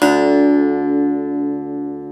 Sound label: SAZ D3, Plucks; Eth Plucks, Pluck Duo